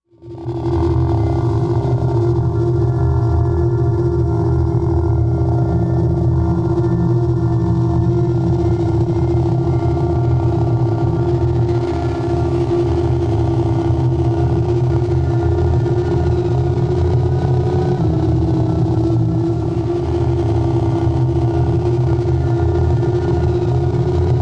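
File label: Crawling Rising Falling, sci-fi ambience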